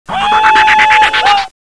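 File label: level up; gungame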